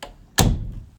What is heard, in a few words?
door close; Golden Crayon Sounds